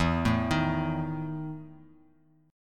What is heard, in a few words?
E Major 7th Suspended 4th E down; EM7sus4 arpeggiated